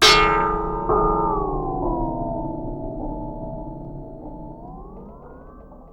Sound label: Moog LPF & Delay, contact mic, Wire & Tin; musicradar; contact mic madness